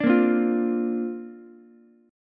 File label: C 7th Suspended 2nd Suspended 4th, down, Ukulele; C7sus2sus4 strummed